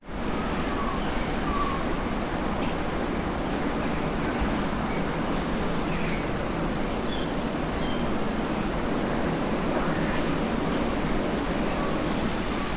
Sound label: ambience suburban atmos wind trees neighbour in garden birds internal by open win; open window